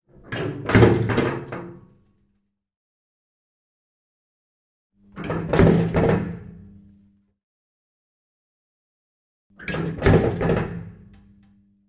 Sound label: Elevator Door Slam